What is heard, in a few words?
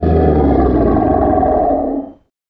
pain lg; rogue; carrier